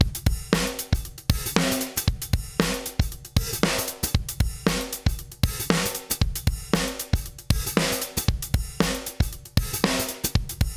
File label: wet Drum Bus